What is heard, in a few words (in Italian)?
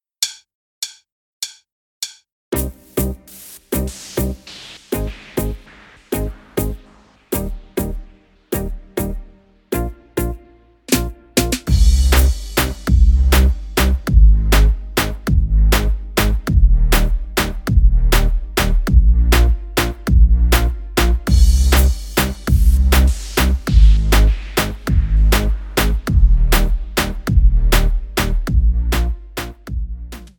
reggaeton moderno